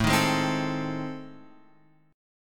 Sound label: A Minor Major 7th Flat 5th, Guitar Open F; strummed; AmM7b5; x,4,3,3,3,4; JGuitar